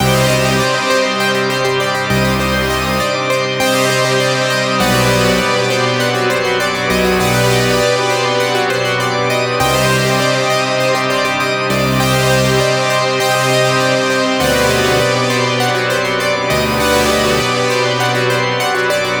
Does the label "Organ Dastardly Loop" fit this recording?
Yes